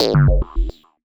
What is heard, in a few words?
Filter Kick